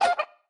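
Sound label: Chicken baby attack, 初级形态攻击音效; 母鸡会用自己的喙不断地啄对手; 绿野世界; 卡通农场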